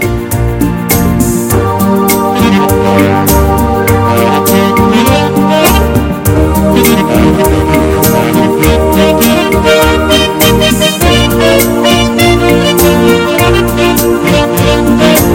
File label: Very Pretty Bolero